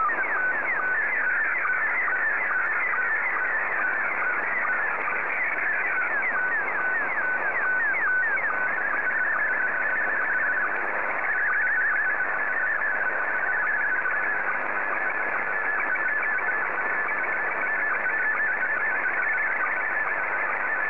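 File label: SSTV, Scottie 2; JA3QJQ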